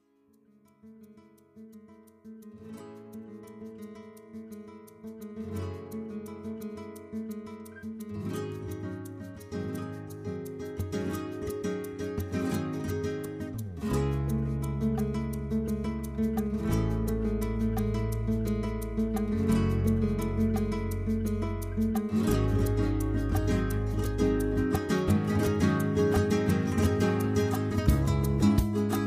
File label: Tribute Band; flamenco; UK